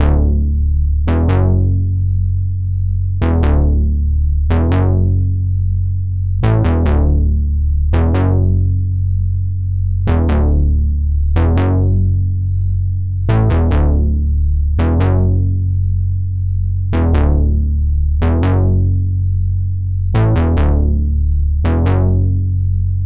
140 BPM F# Min Bass; Cymatics; Destiny Gold Expansion Stems; Cherry Soda

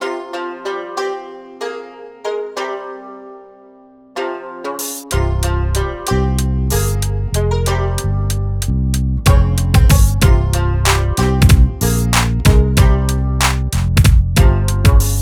094 Cmin, Hip Hop Exotica

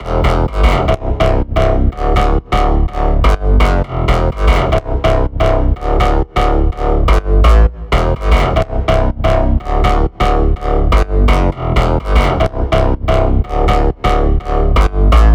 Big EDM Bass Loop E3; Future House; Future House Tools; Bass Loops

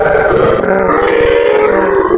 Goose, Animals Wavetables; Cymatics; Serum; Animals for Serum - Gold Edition

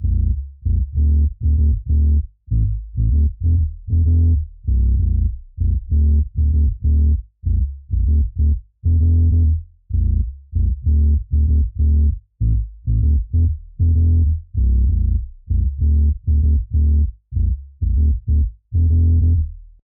97 BPM A Min Bass; Cymatics; Destiny Gold Expansion; Kodak

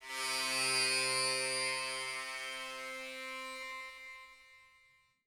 Synth, 090 Cmin

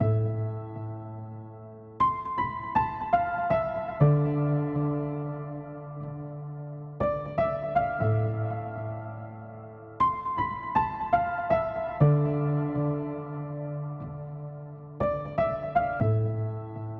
120 BPM A Min Piano; Cymatics; Destiny Gold Expansion; Blood Ties

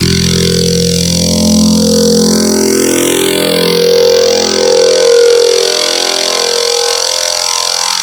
Growl Table, Serum; Cymatics

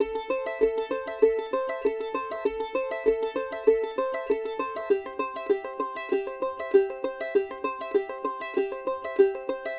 Charango, Hip Hop Exotica, 098 Amin; Big Fish Audio

